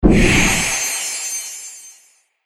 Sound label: Magical Impact Whoosh